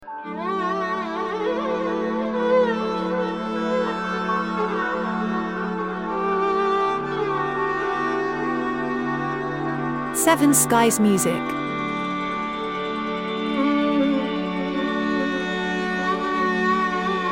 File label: Indian Lounge